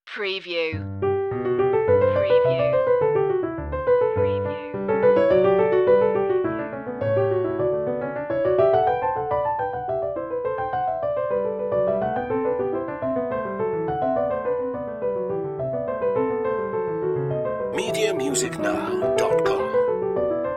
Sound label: bach sinfonia in g major allegro; bwv 796